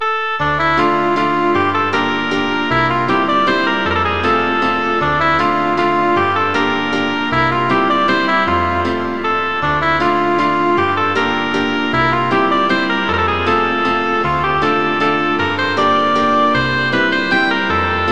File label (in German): oboe u klavier; walzer a moll; chopin